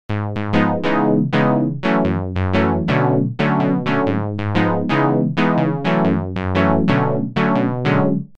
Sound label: synth ex clav; opxpro